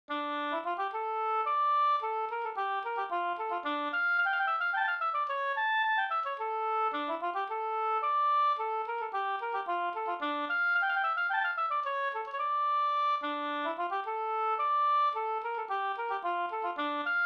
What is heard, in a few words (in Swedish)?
polska Bingsjopolska; e Pekkos Olle nr1 e Pekkos Helmer